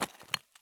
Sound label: walk tile; Escape from Tarkov